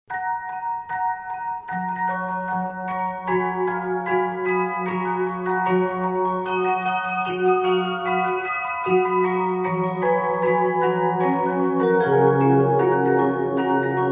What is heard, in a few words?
Romanza